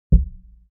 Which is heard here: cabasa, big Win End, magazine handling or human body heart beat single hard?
human body heart beat single hard